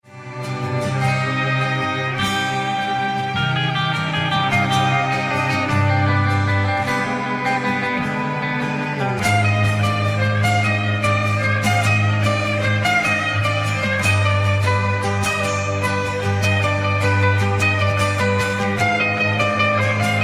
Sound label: acoustic